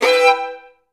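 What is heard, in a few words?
violins E4; Best Service; Peter Siedlaczek's Advanced Orchestra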